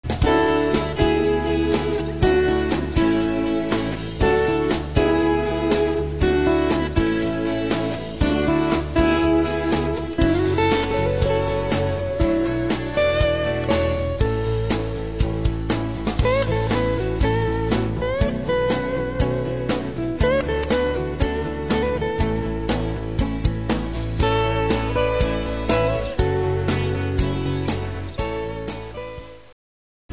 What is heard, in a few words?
Country Highway